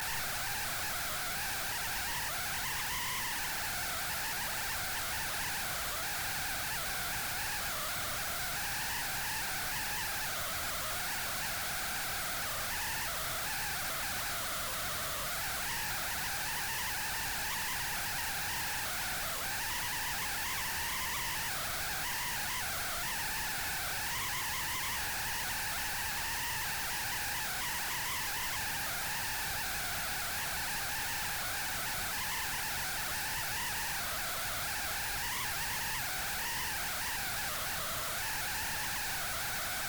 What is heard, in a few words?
Ghost sound